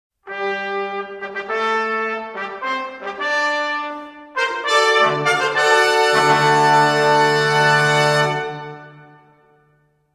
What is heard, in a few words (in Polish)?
Fanfary